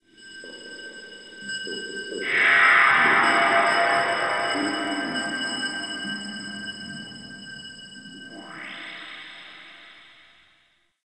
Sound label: Zariman Factory Texture, Ambience; Warframe; The New War